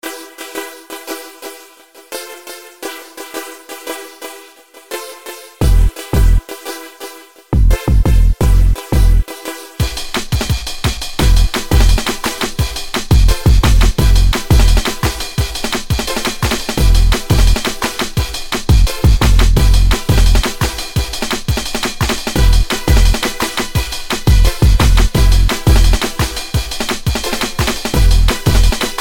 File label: Wicked Disto